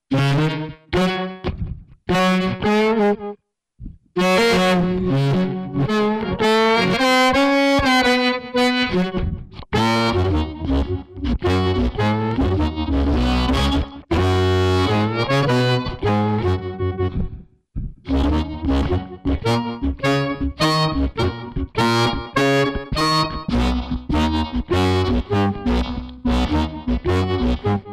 bsman amp oct dn 2oct dn delay, bari bass harp sounds